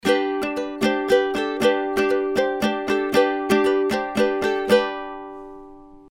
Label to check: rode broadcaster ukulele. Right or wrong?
Right